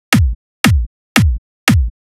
Dholki Beat

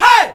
Vocal

